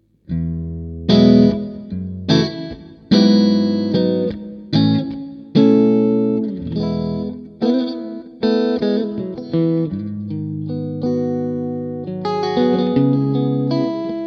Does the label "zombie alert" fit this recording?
No